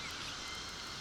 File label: Background Noise